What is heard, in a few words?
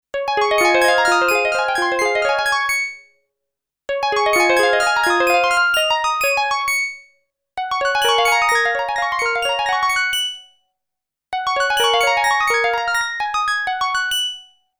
korg m1r synth; synth: panning; cpu NEC-V50 (part of 70216 family) manages 16 voices polyphony